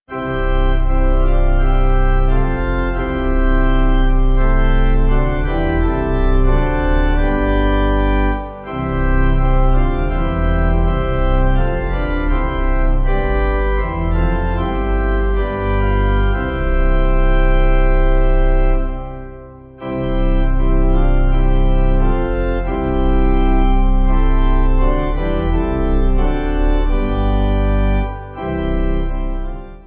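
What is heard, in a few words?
Pipe, Pipe Organ backing; Blest Be The Tie; Boylston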